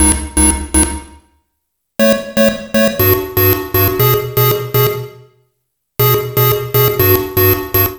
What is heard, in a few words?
Game Play, Synth SFX; Sonic Foundry (Sony Creative Software); The Electronic Music Manuscript Collection